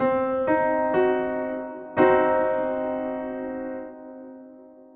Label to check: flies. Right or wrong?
Wrong